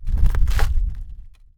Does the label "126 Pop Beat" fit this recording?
No